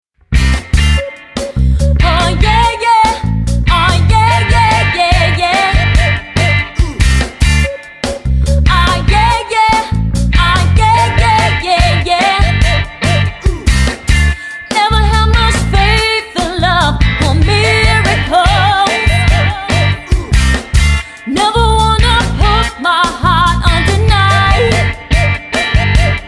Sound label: Rock Pop Band